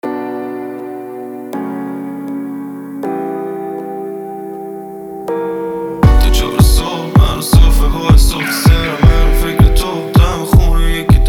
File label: Lead